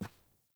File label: Grunt Boot Walk Default; Characters; Enemy; Melee Grunt; Footsteps; Boots; Sinecure